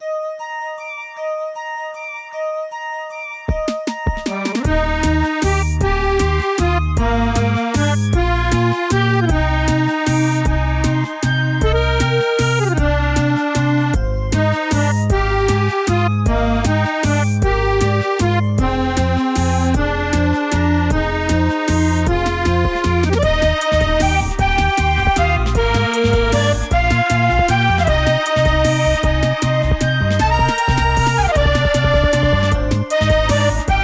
Freezy Sneezy Waltz; Hayfever; ps4; windows; xbox one

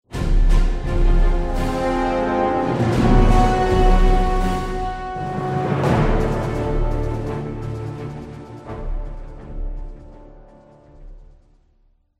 Zeppelin Reveal Gun Battle

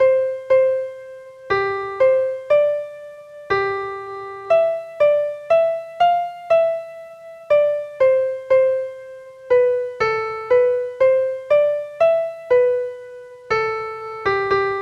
Piano; Piano Game Faithful

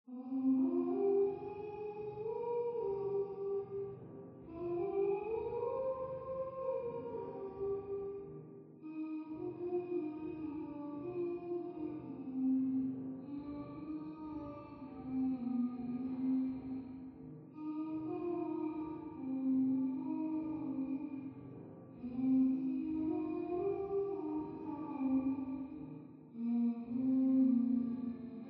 Humming

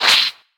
Join Hit; boomerang fu

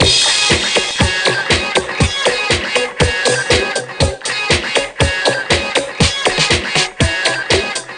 Music Dance

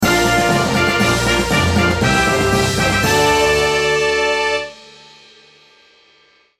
Jingle Fantasy

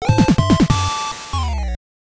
Battle Won; Dark Lord; NES

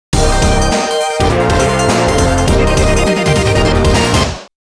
Round Clear; Namco Arcade Sound Digital Collection Vol. 2

